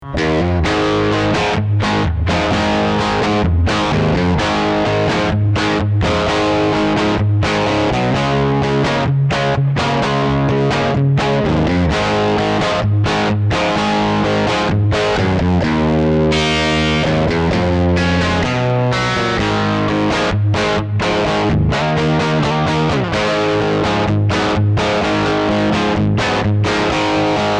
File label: Rock, MultiFX; iRig UA